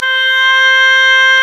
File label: OBOE